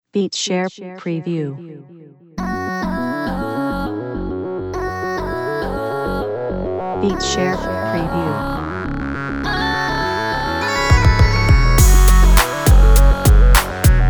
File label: urban bpm102 sample